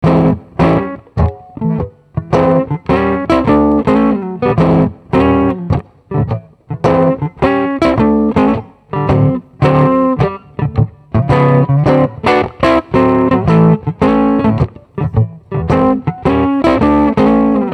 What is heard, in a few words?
overdrive; DirT-Bone; Court Fat